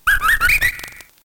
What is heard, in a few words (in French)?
Cri